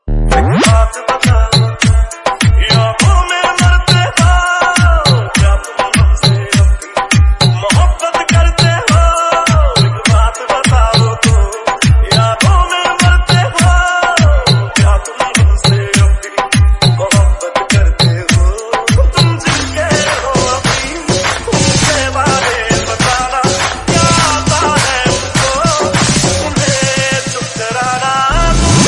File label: Remix Version Ringtone